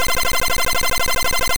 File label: Ice Beam Loop